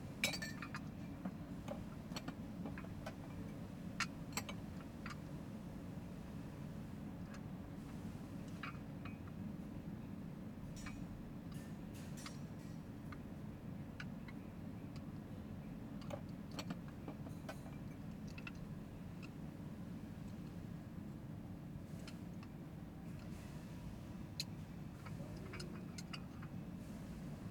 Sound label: indoor abandoned cafe; LOOP; anemoi